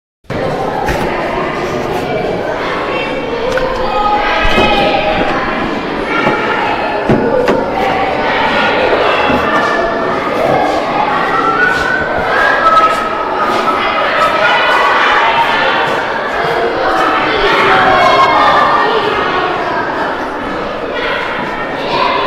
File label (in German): laerm und klingel